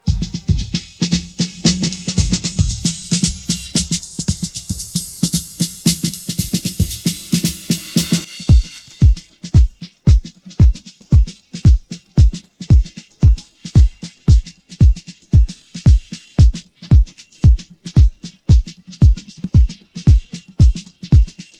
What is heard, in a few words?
drums pattern